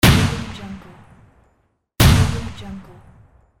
gun shot sound

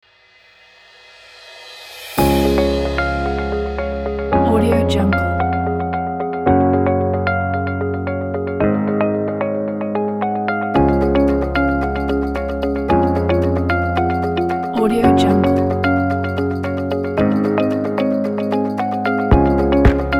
new fresh motivate

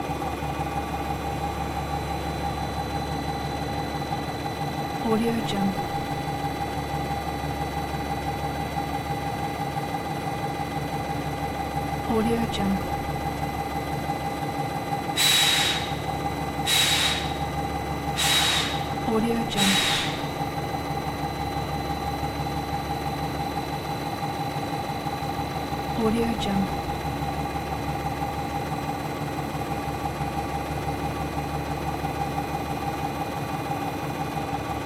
transportation train engine idling italy; blazznet

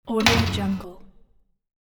closing the door

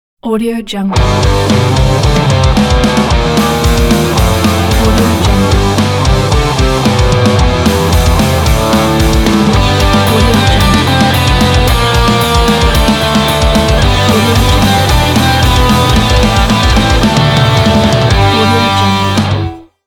pop punk ident

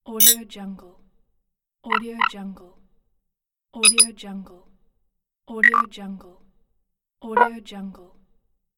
low battery notifs

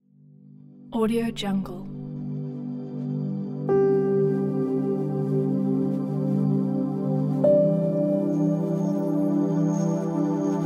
cinematic inspiring soundscape

